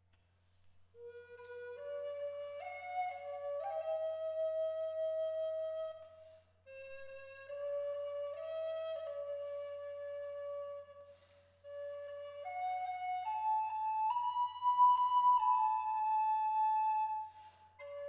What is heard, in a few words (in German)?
Walnuß B 432Hz Sound off Silence